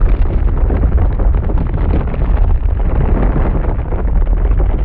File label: Quake Rumble Loop; Destruction